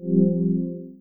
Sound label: pc messagebox; OneShot Fading Memory